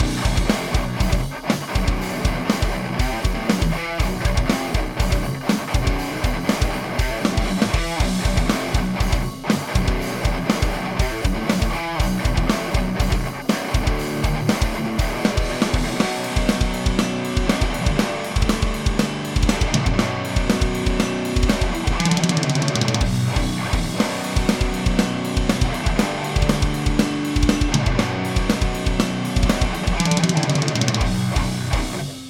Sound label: Gtrs